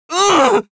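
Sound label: pain severe, Spanish; Scout; Voice file from Team Fortress 2 Spanish version.; Scout audio responses/es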